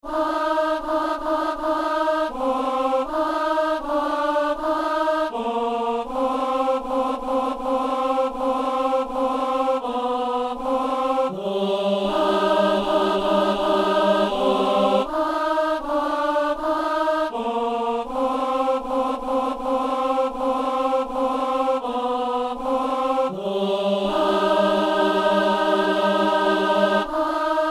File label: alto